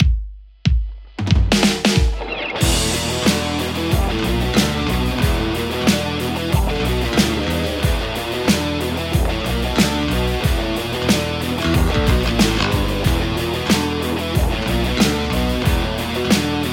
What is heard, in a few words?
Les Paul; overloud th3